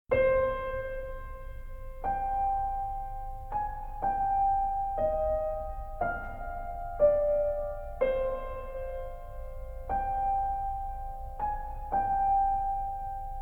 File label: Piano; Identity V